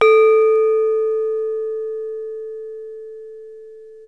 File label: saron